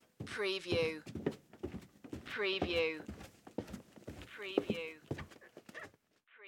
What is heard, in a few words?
Footstep Stairs Carpet